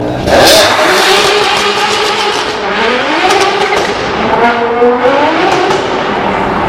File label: Ferrari 599 Burnout